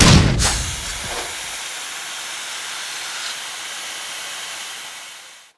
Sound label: explode smoke